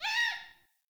Trapped Beaver Call; Encounters; Animal Rescue

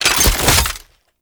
Sniper Swap To; DE; Warframe; Frumentarius; Exalted Sniper

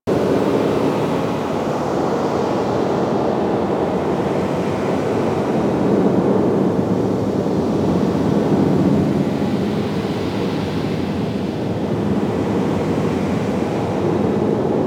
Ocean Cave Amb

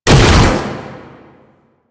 Taller Door Open Mech; ambience; Warframe; Entrati

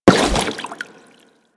Step Water, Footsteps; Enemies; Crewman; Warframe; Corpus

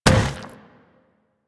Zombie Run Metal, Footsteps; Warframe; Infested

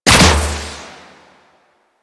Antler Bow Fire; DE; Warframe; Weapons

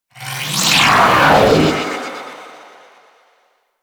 Light Ability; Warframe; Harlequin

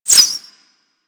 Arrow Whizzby; Warframe; Weapons

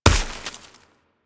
Snow Ball Impact; Warframe

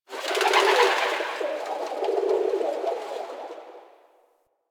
Gate Bird; Ambience; One Offs; Ostron; Warframe; Eidolon Plains

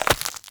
Agaric Mushroom Grow

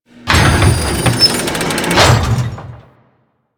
Water Wheel Start; Ambience; Props; Warframe; Grineer Forest

